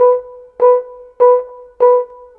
Horn, Orchestral, Rock Pop; Sonic Foundry (Sony Creative Software); Orchestral Series VOL-4 - Rock and Pop

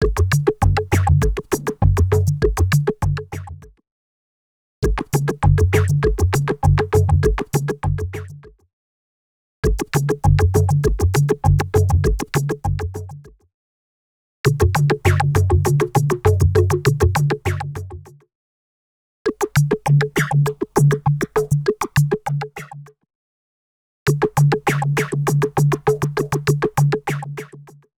African 100bpm; X-Static Goldmine 5

